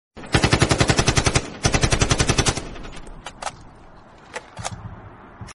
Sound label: ak 47 gun sound